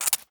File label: Syringe Squirt; Medical; Blastwave